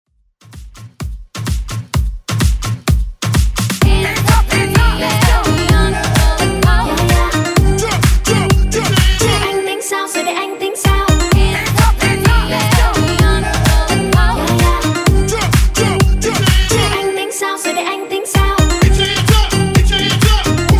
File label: Calle Hype